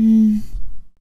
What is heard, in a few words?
Moan